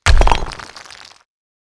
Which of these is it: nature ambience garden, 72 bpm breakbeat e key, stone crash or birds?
stone crash